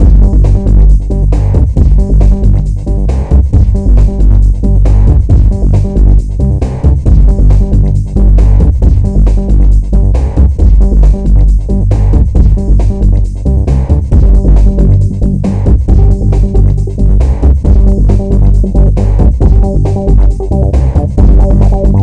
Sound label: Dodgems Beat Style; RollerCoaster Tycoon 2